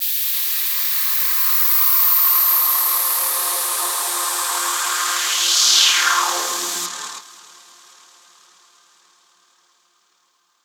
Noise FX; Singomakers; Infected Psytrance

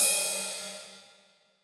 Ride, Cymbals; Minimal House

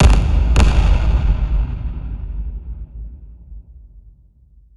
Impact; SFX

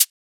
HiHat, Psytrance; Drum Shots

